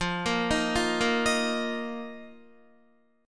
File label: F 7th Suspended 4th F down Guitar; arpeggiated